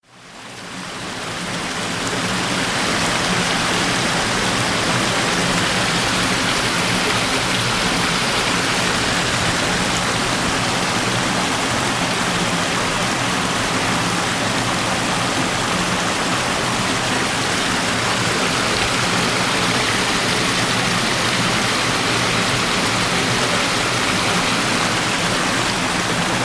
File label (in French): ambiance